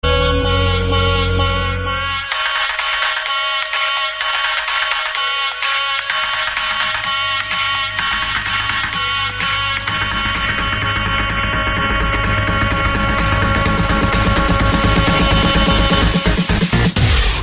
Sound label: trance mix